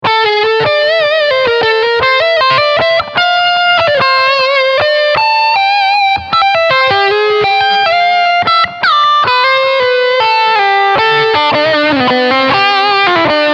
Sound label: Front SCs Leadline